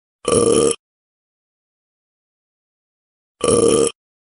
Belch

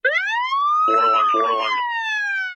alarm fire

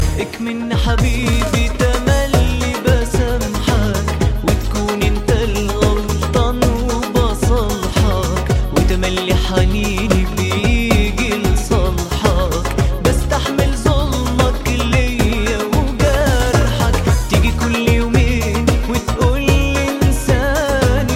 Sad Arabic

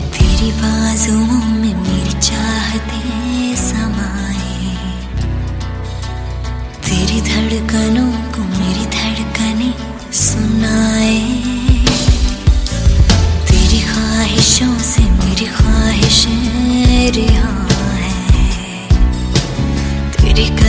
female

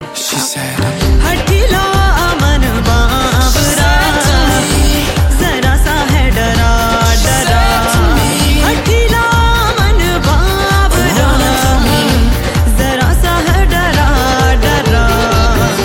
Heartbeat

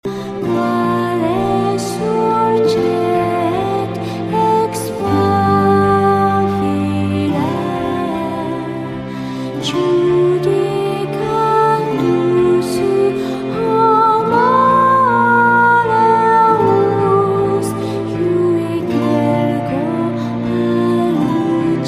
Lullaby

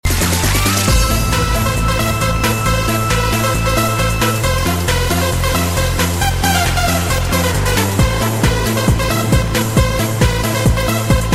Techno Korean

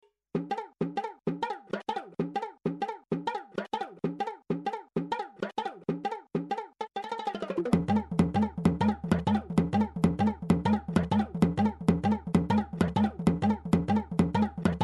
Bongo Beats

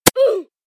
Hitmarker Oof

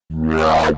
Notif

